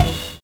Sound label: HORROR; Univers Sons - Kitch 70s